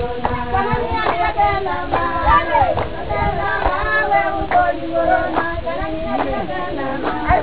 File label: Women Singers; Navrongo